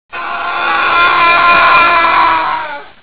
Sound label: argh